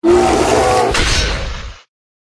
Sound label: Bear